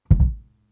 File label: Kicks; One Shots; Touch Loops; CosmicFunk